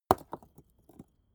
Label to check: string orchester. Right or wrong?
Wrong